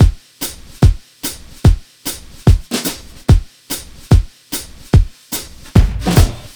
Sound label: Beat 73 bpm full; ElectroSwing; WSL-ElectroSwing Vol 4 Additionals